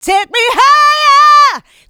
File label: mixed vocals; Houseworx